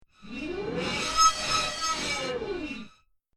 Whoosh SciFi Space Vehicle Start Up Stall; Scifi Science Fiction; Spacecraft; Spacecrafts

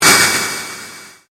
Convolution Pulse

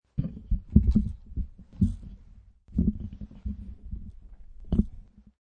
Mic Handle

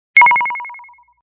Short Ring

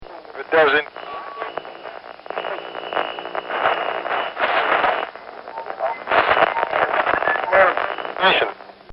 Static On Tube Radio; Sound Design

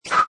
Button Shimmer Scrape Fuzz; Scifi Production Elements, Set 2

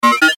Chime Multimedia; Web Interactive; Chimes (Sound Effects:Multimedia); Blastwave; Technology

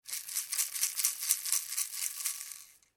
toy rattle shake; Toy Animals; Toys

